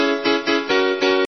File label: piano